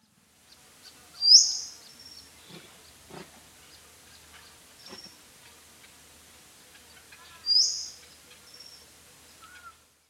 Australian Golden Whistler Pachycephala pectoralis youngi